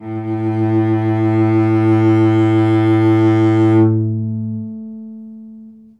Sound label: Vibrato